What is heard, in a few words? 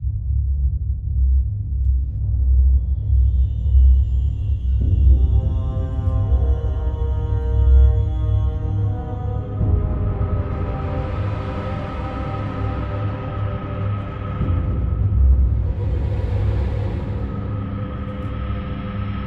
music molten standard; the long journey home